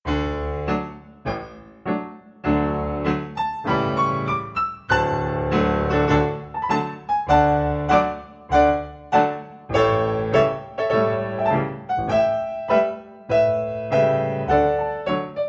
battement tendu on a 4 pas de trois male variation; pas de trois male variation from paquita; lugwig minkus